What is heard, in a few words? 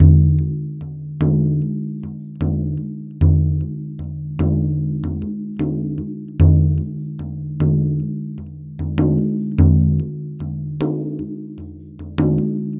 Frame Drum, Ethnic Percussion; Sonic Foundry (Sony Creative Software); Ambient Atmospheres & Rhythms